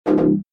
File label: error